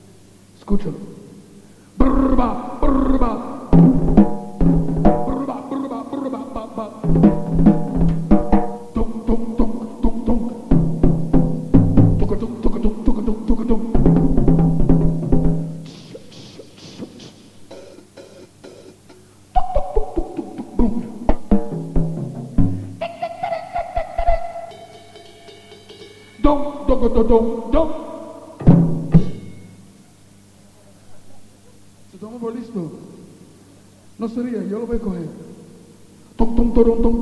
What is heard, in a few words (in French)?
batterie